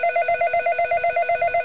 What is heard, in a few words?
ringing